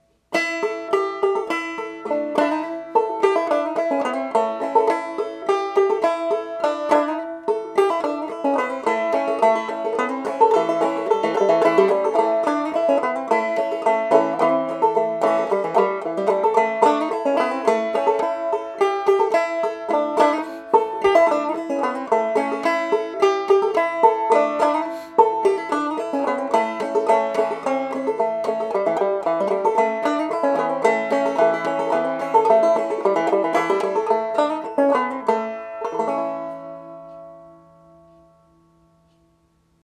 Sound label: Banjo A; Old Hen